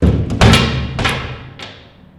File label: Jail Door Hit Chain R; jail & prison doors